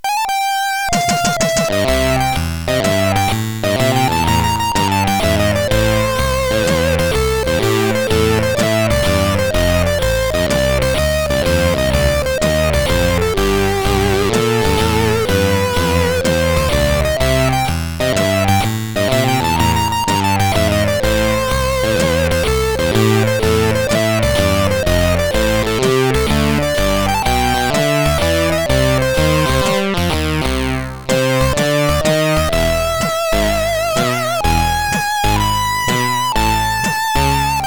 sid CSG8580R5; hvsc

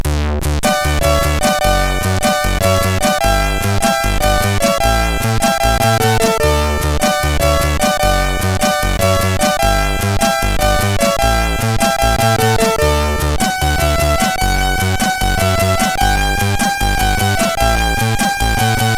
Rockzak, MOS6581R3

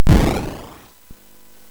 SFX, MOS6581; Narco Police; MOS6581R4; hvsc

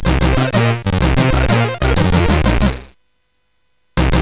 funk level, CSG8580; CSG8580R5; Orcus; hvsc

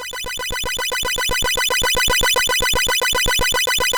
Project Firestart, MOS6581R4

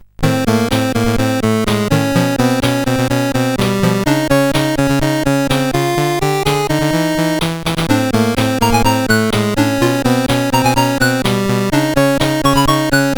sid CSG 8580R5; Lemmings

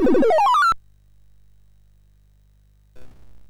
Power of Black Bandit, MOS6581R2

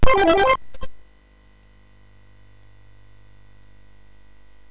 sid, MOS6581R3; New Zealand Story; hvsc

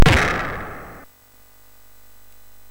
Fast Sfx, MOS6581R3; hvsc

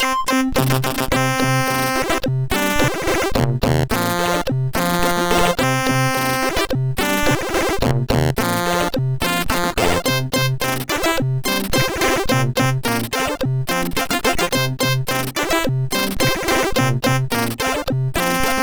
Happy Tune, MOS6581R4

